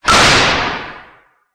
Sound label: Rocket Launcher Sci Fi; 1001 Sound Effects